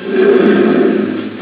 Gorilla Roar; Showa

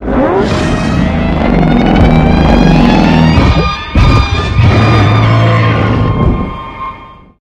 SFX; King Ghidorah 2019

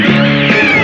Roar; King Takorasu

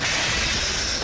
Roar; Nerscylla

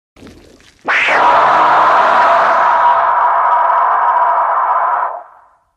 Roar; Gyaos; Heisei

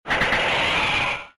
Gelderah roar

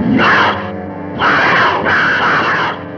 Dragon Dinosaur roar; Son of Kong

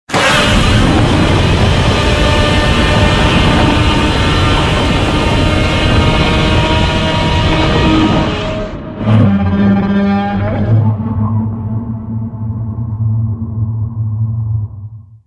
roar recycled extended roar; Godzilla

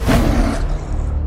Apes sound

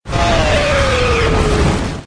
Bubble monster rawr

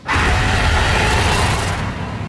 Roar; Rathalos

